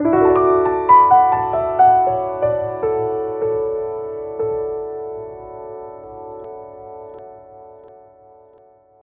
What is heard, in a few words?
classic piano sms tone